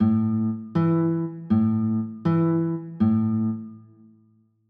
a 6, chords

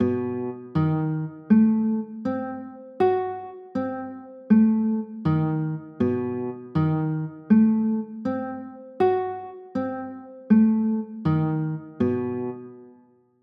b 7 b9, chords

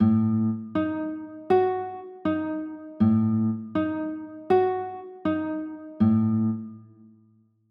a dim7, chords

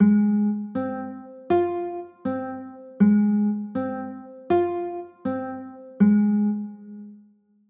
b flat 9, chords

